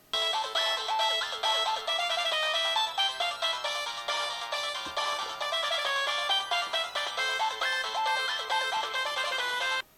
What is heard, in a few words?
samsung sghe700 ringtone